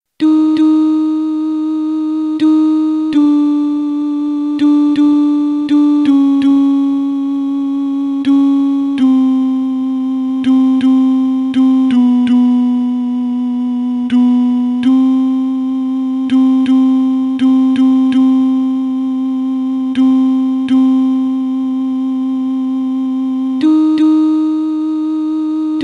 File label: alto